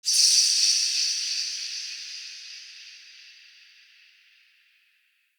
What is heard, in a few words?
High Creature Echo; UnderCity